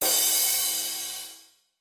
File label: crash, drums; SimpleDrums